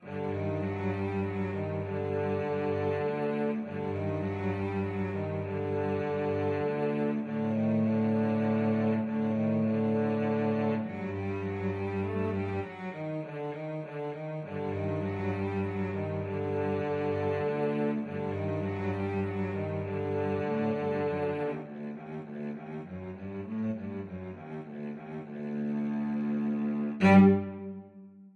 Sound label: cello duet